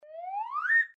circus clown slide sound

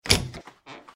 door open squeek

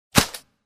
rubbery snap

